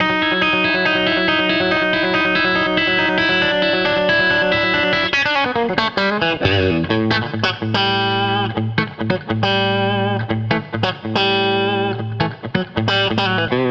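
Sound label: Country Guitar (Bridge); Digidesign; Pro Tools Essential Loops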